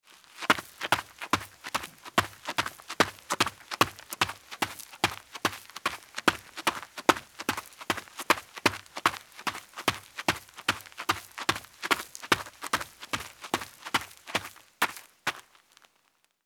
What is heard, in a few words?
Footsteps Sneaker Dirt Run